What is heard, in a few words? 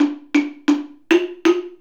Wood Block; Sonic Foundry (Sony Creative Software) - Essential Percussion